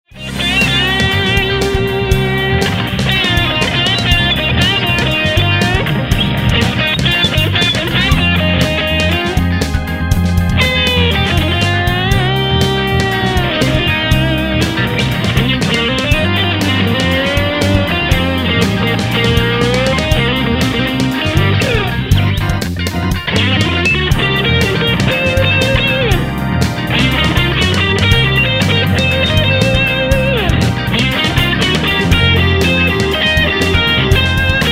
Funk in C Solo Guitar; Blues Solos

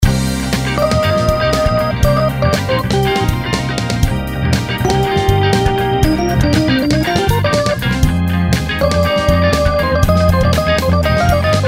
Funk in C Solo Keys; Blues Solos

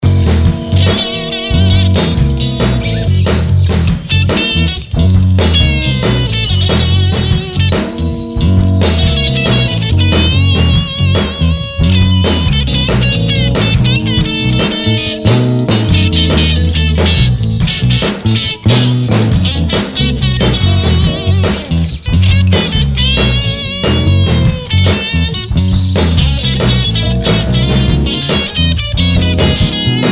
Funking Out In F Sharp 70 Solo Guitar; Blues Solos

